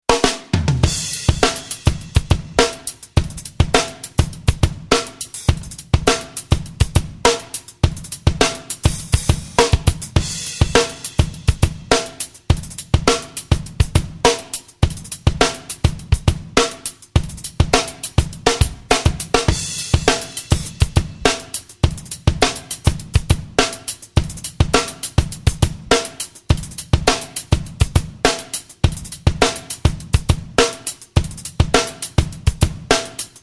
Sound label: Drums Tube Comp EQ